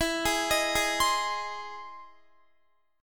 E Augmented Major 7th E down Guitar 4F 4A 5C 5G 5C 6E; arpeggiated